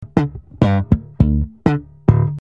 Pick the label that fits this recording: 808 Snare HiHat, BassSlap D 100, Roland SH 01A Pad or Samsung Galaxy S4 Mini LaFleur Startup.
BassSlap D 100